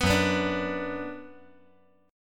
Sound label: Gb Suspended 4th Sharp 5th, 5G,4C,5E,5A